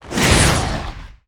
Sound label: Swamp Wizard attack; Swamp Of Darkness